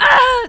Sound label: pain, player; QUAKE2; female